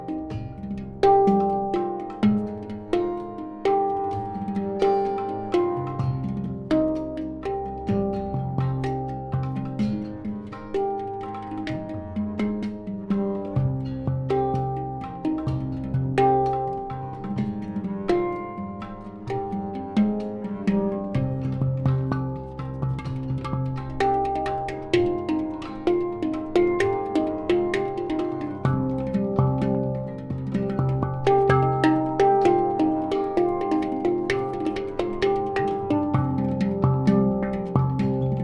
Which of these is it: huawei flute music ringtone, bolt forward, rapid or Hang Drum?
Hang Drum